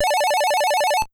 Basic Phone

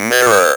MIRROR

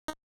blip

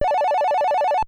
Basic Phone

